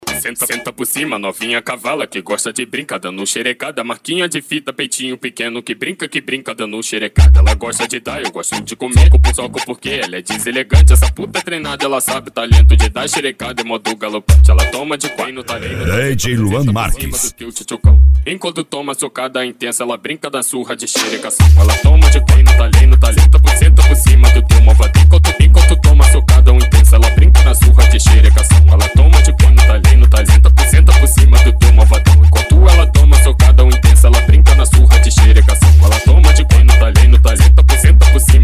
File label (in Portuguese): funk grave